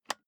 office chair lever

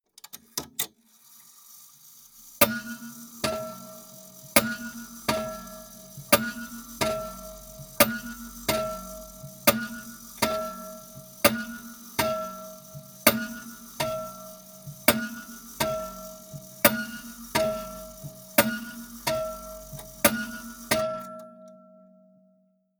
clock strike

